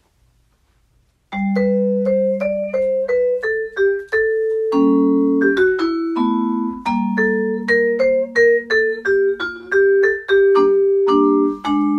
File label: head, vibes